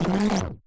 Vox Crush Warp; Lost Tapes From Mars

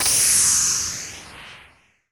noise fx; Masterbits; Switch FX

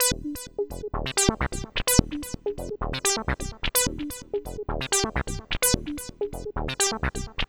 Lead

